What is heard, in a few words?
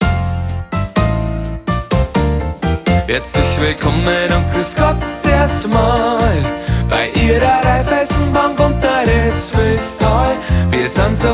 Jingle; 16bit PCM 8kHz